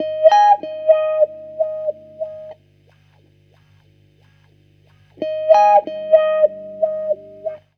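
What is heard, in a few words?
guitar, Roots Funk; USB Soundscan vol.41; AKAI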